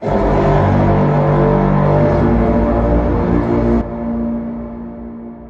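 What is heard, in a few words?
vikings deep gjallarhorn horn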